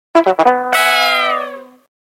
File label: horn